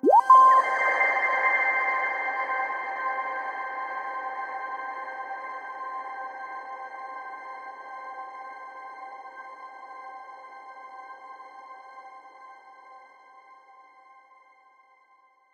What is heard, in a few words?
Satellite C, Simply Space